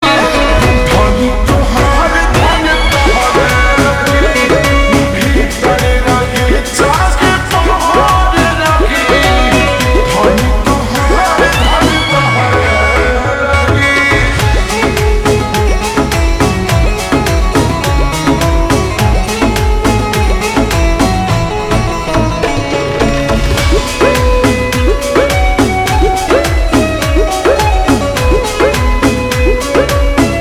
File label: Slow Reverb Version Ringtone